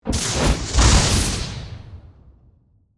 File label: Magic Spell Fire, Whoosh, Impact; Horror; BlastWave